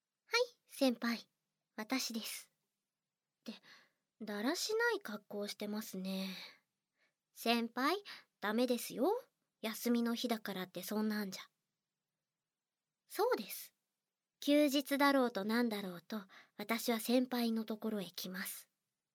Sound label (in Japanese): 耳舐め手コキ SE無; 効果音無しver